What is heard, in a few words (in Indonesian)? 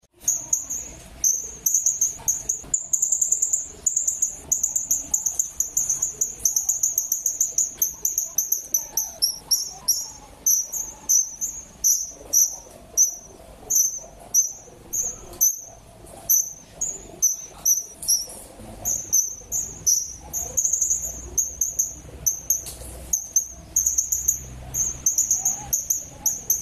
serindit